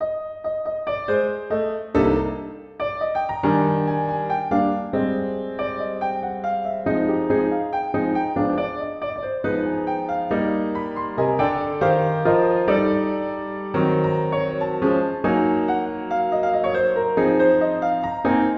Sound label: latin etude piano